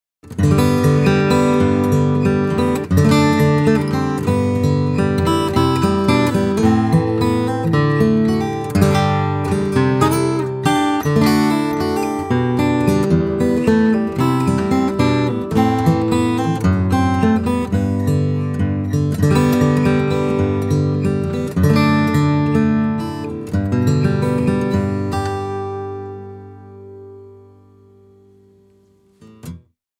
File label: Guitar Sample